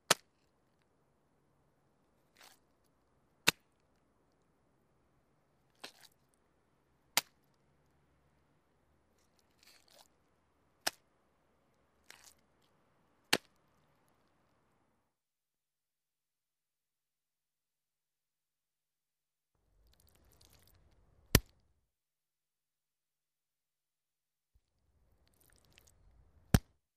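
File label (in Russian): чиркают спичкой не зажигается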